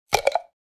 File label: Glass medicine jar lid opening